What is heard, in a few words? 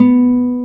G B2, NYLON G; AKAI S-Series CD-ROM Sound Library VOL-1